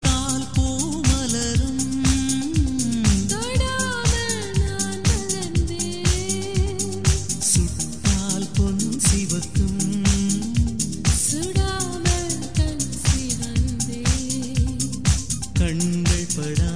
remix Tamil ringtone

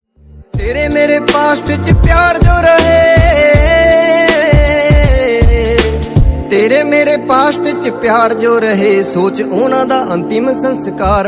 punjabi song

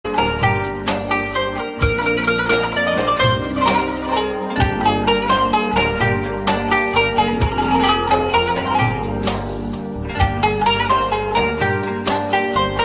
turkish tabla sound; ringtone

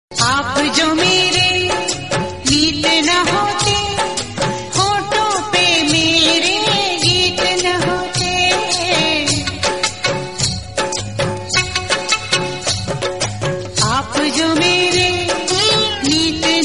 romantic song, ringtone

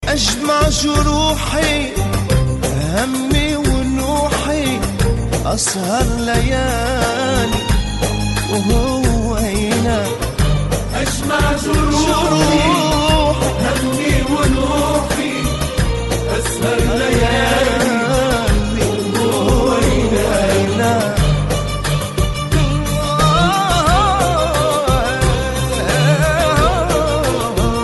arabic song ringtone

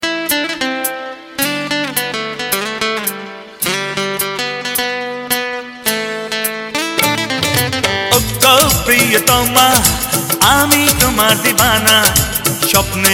hindi vs bengali, ringtone

